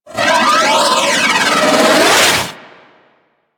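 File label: Missile Projectile Incoming; Enemies; Weapons; Warframe; Corpus; Arachnoid Camper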